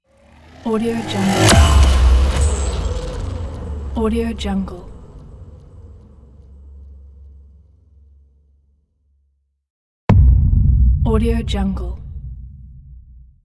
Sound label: massive cinematic transitions